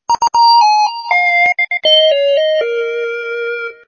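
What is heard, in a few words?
hip Hop keyboard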